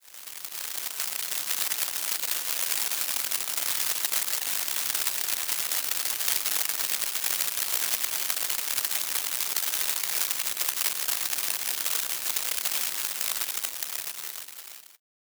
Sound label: hail